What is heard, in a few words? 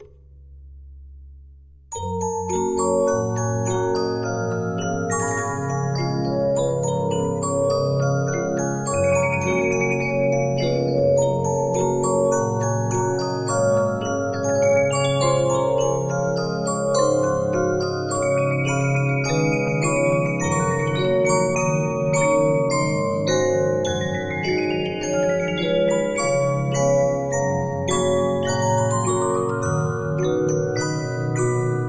Regina, 15inch disc musicbox